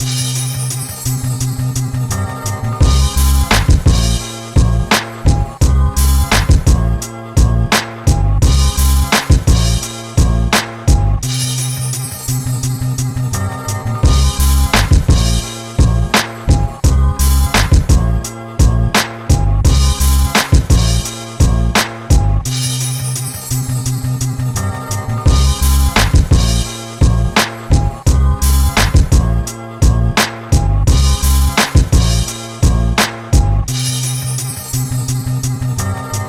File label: Timelapse music; GizmoSlip iPhone in Jolly Ranchers video